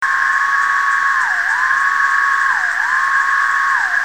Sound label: Periodical Cicadas; Michigan Cicadas; tdecim